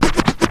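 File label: scratch, record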